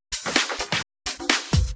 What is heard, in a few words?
Electro Loop 128 BPM; Vengeance Samples